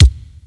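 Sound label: BD, bass drum; Crypton - DrumBase5000; CD DRUMS #2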